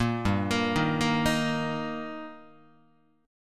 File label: G Minor Sharp 5th G Guitar, chord; arpeggiated; JGuitar